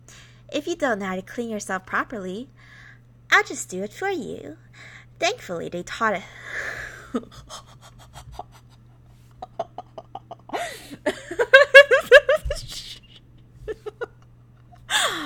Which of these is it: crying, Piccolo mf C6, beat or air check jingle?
crying